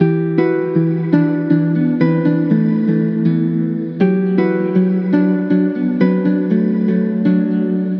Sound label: Musical Loop 120 E Minor; Resolve; Ghosthack